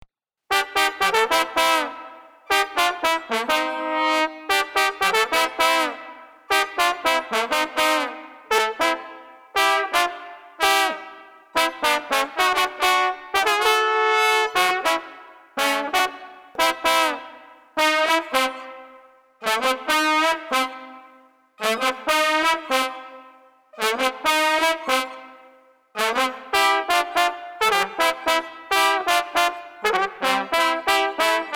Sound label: Street Trombones Festive